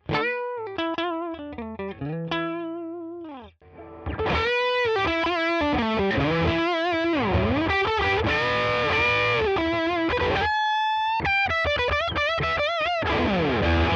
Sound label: Walrus Fundamental DRIVE Bright Lead